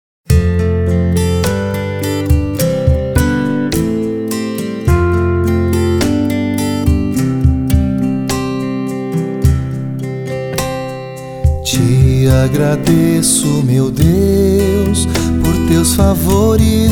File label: Song, PT